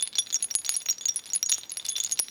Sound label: bell